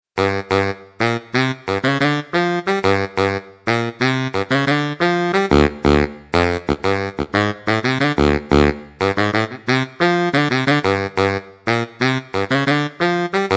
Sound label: Baritone, sax; Montage